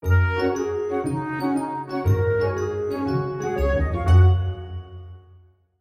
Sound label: sting good event; The Sims 3; Windows